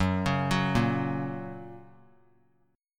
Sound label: Gb Major; Gb arpeggiated; JGuitar